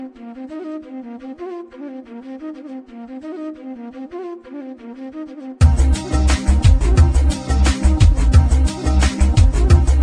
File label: Indiann Trance Indian Ringtone